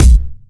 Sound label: crisp steel kick drum B key